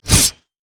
pirate basic attack; LoL; gangplank

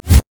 baleful strike

